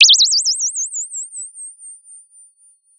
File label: Sound of a bird tweeting nicely